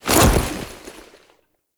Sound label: foley melee sweep; Warframe; Characters; Kahl